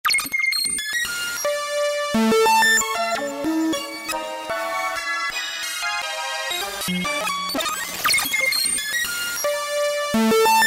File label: sfx Goblins, E-mu PK-6